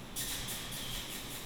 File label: air, Train Sounds; R110B; OpenBVE